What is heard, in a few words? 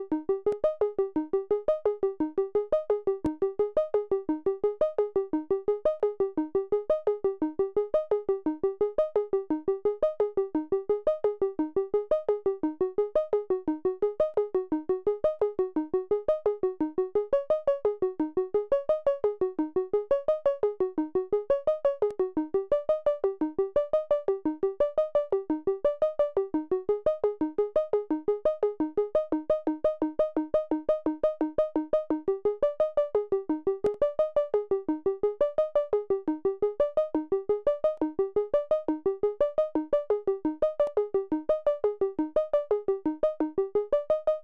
arpeggiator sample